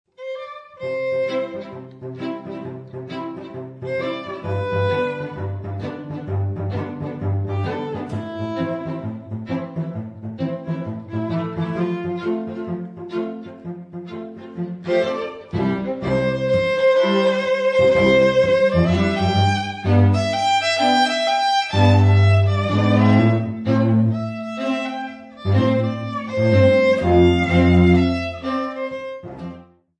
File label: String Quartet